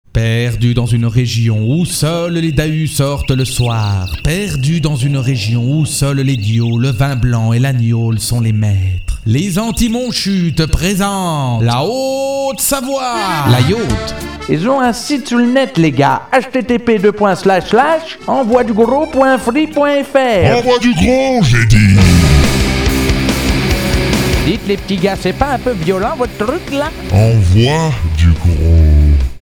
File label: Jingle